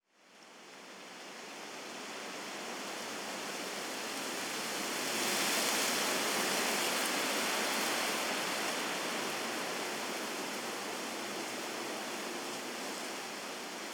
wind tree; ambient; nature

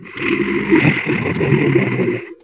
ballbot devious laugh; player; Portal 2

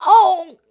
death fem; player

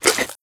breath out, headcrab black; creatures

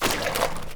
paint blob splat; Portal 2

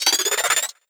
manhack charge power; npc; Half Life Alyx

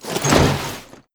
shield equip; Counter Strike Model Pack; CS:GO; survival

